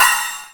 HAT OP; MPC-60 DRUM2; Greytsounds VOL-1

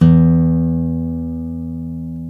GTR, Dual Guitar; Club-50 - Foundations; Paul

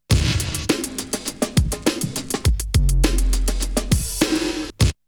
Drumloop; ZERO G - Datafile DISC 2

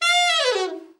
ALT FALL, ALT-SAX FALL; Giga Samples Collection

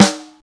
Natural Snare; Drums; Snares

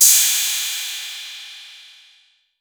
808, CYMBAL; Electronic; Wizoo - Platinum 24 Electronic

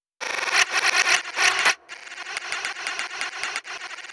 granulized glitch

